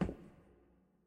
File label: concrete step; Minetest; backrooms